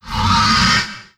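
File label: raptor hit; sf effects; sinfar haks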